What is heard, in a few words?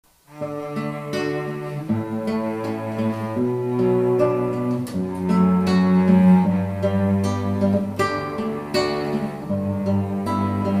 Guitar Kanon